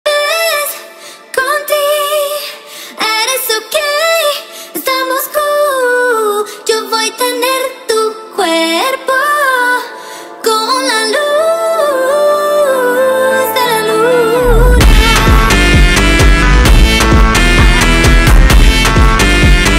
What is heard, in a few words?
Phonk